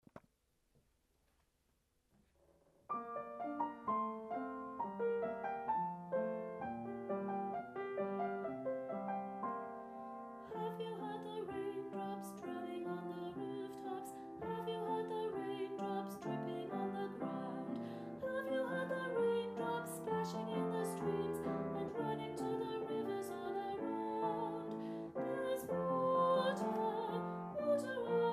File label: vocals